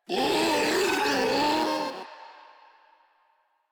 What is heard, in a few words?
Infested Ancient Vocal Attack; Warframe; Ancient Ninety Nine; Proto Infested Ancient